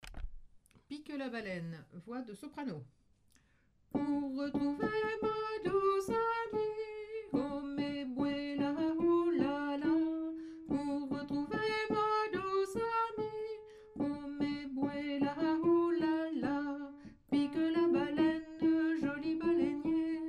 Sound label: soprano; pique la baleine